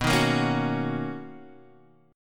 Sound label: B Major 9th B, chord; BM9; strummed; x,x,x,4,3,5,3,3